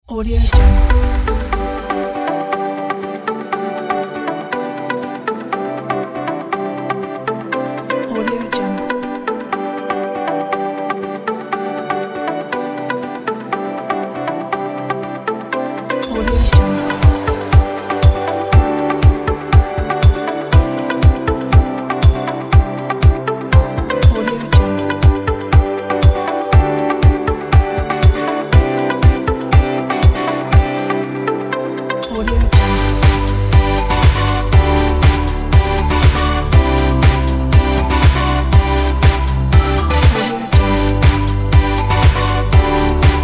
summer corporate inspiring

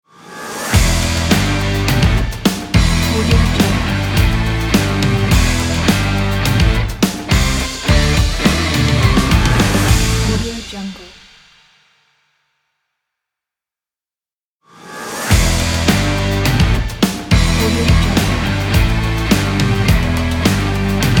sport rock intro; wolf music

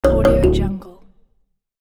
conga bass completed prompt

